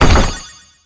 item box; jailbreak

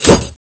water knife slash

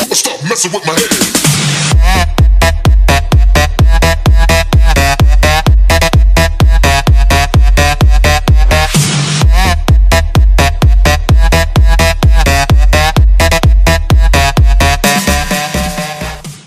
electro; roundsound

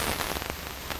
static light; cstrike; D26 slender

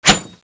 frost knife slash

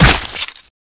sword hit

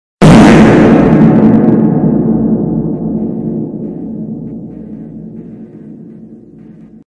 Fart Effect; cstrike

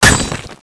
frost knife hit wall

